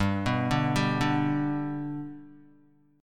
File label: G Major G down Guitar 4F 4A 5C 5G 5C 6E; G arpeggiated; JGuitar